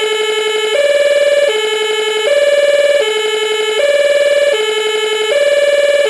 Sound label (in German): DE Kompressorhorn; Tonfolgen Hella; Dachbalkensystem RTK 7 Konfigurator